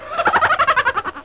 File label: laugh; Our Gang and the Little Rascals